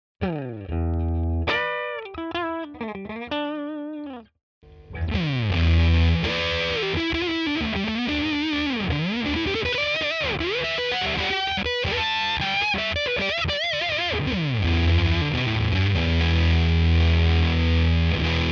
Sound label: Walrus Fundamental FUZZ Classic Lead